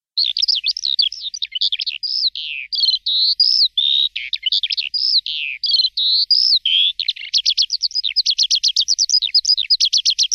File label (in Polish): spiew skowronka odglosy